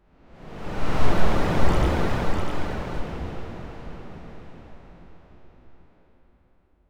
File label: short soft whoosh, myster